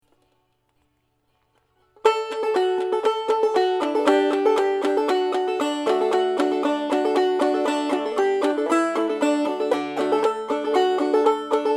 rock basic

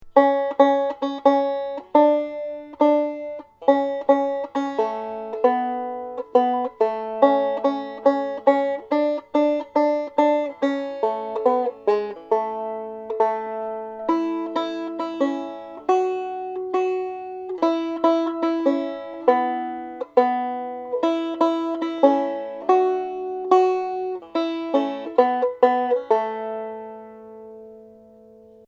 bile melody

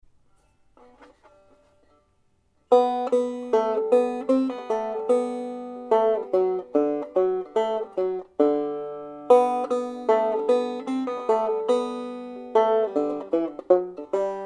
nail melody

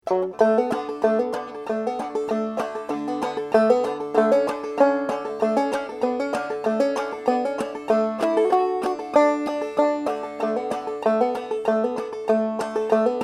ragtime basic claw

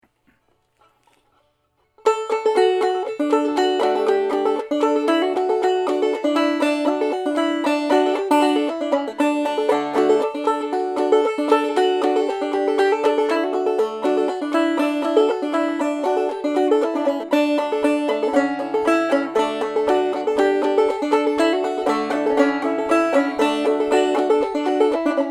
rock full

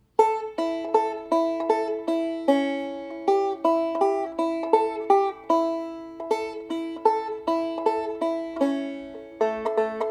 june melody

